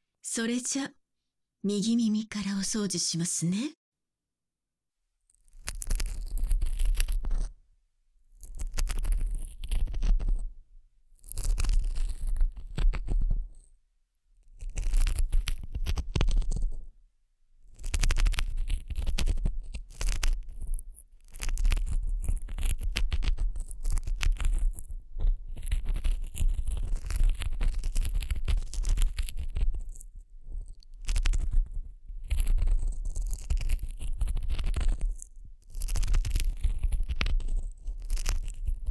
30分間彼女ママに両耳を耳かきされ続ける安眠癒し音声

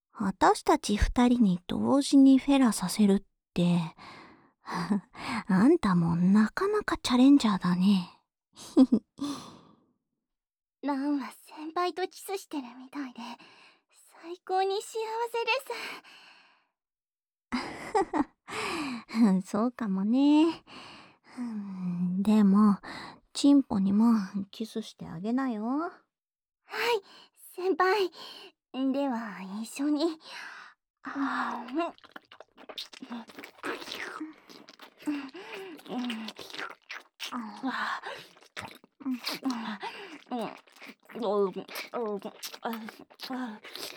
フェラ 二人同時

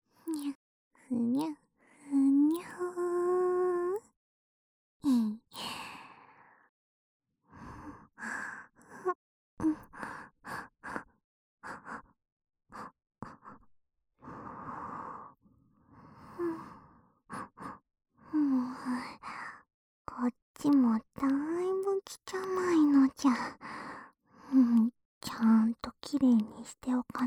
耳の奥までたっぷり耳舐め責め（左耳）, ASMR

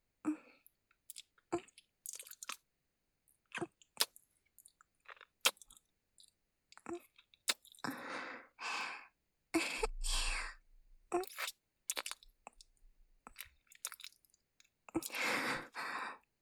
キス(接吻)

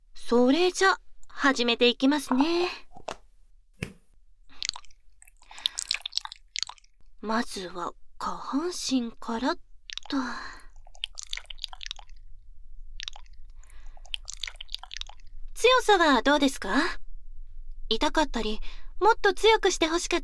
耳舐めされながらローションでねっとりマッサージされ続ける音声（キス・手コキ・フェラ）

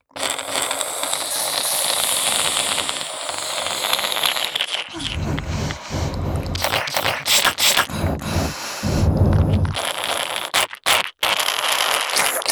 安眠用耳吸い(両耳)

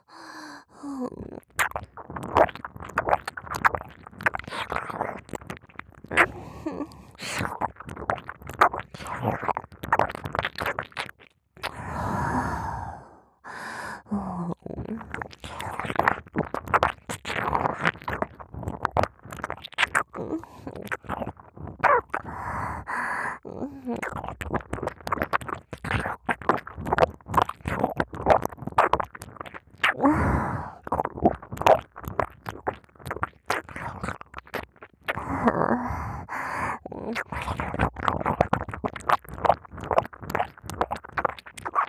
手マンコとひょっとこバキュームフェラ 耳舐め, ASMR

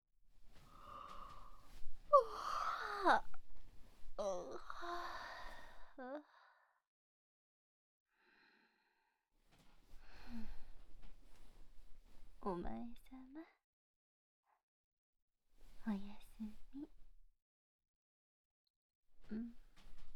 おやすみ の キッス; ASMR

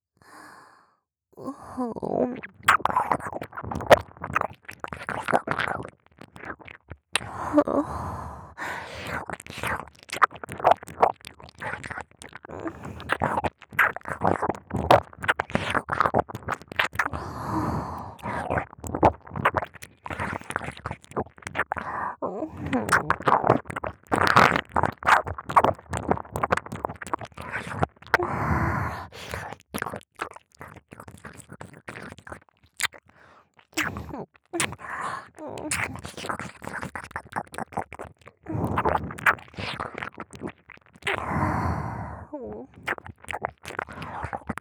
耳舐め, ASMR; チン嗅ぎと裏筋舐め上げ; 左

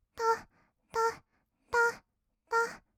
たったっ, kawaii voice, 合いの手

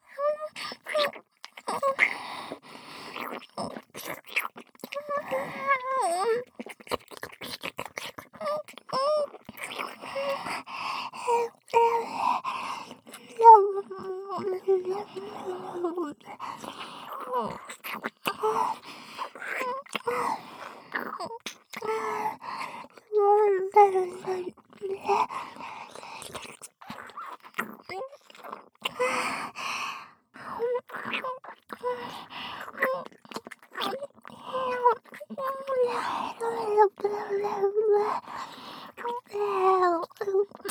鈴のディープキスループ, ASMR

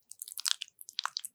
くちゅ音

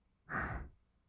"あ", ブレス, 吐く音, kawaii voice; ASMR; 1200 kawaii voice!!

